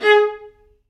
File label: Vla G#3; Digital Sound Factory Virtuoso